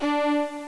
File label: VIOLA